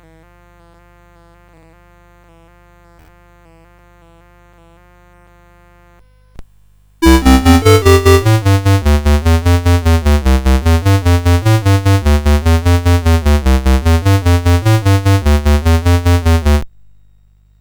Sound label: CSG8580